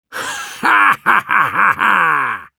Demoman laugh evil

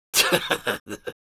Soldier laugh short

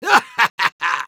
Soldier laugh short

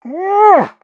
taunt flip exert; Pyro

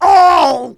Demoman pain severe

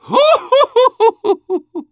laugh happy; Medic

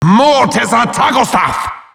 attacks

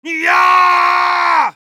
battle cry; Heavy

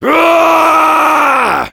Heavy battle cry, fr

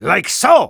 taunt flip exert

